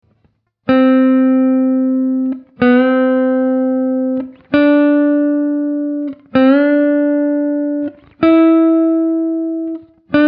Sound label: Bend